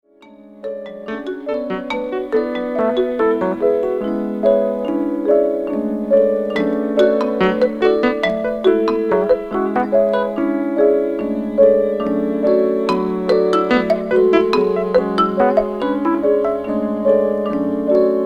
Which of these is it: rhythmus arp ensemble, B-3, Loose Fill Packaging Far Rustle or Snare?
rhythmus arp ensemble